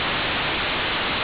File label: noise